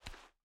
Barefoot; Game rip; MiSide

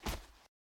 Step Ground; Game rip; MiSide

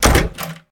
Open Door; Slouch; MiSide

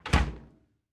Fridge Close; Game rip; MiSide

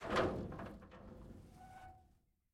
Door Iron Take Open; MiSide

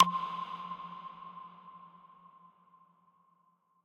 Tick End; MiSide

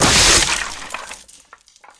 Destroy Corridor; Game rip; MiSide

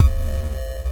Noise Start; Game rip; MiSide

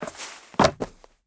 Jump; MiSide

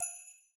Table Click; Game rip; MiSide

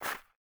Snow Ball Floor; MiSide

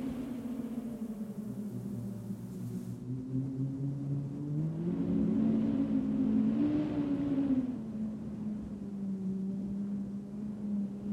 Wind Window; Game rip; MiSide

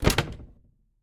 Door Open; Chibi; MiSide

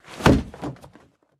Body Fall; Mita; MiSide

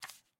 Change Card; MiSide

